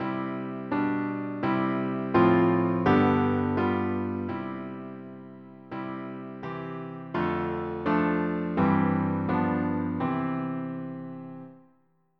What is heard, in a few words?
CRES DIM